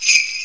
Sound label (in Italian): SONAGLI; Sonagliera